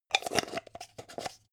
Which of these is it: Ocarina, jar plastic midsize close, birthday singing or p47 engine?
jar plastic midsize close